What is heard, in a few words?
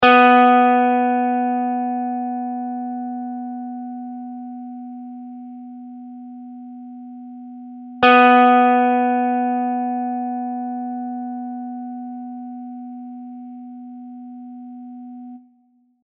tune 2B